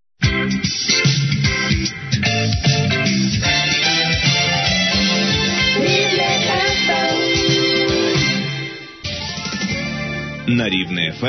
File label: interval signal; ur rivne